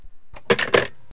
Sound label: phone